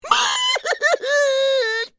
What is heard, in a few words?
Pig fear; bad piggies; android; mobile; windows